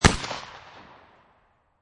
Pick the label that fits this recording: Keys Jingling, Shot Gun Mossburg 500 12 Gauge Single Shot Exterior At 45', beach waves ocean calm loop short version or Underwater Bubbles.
Shot Gun Mossburg 500 12 Gauge Single Shot Exterior At 45'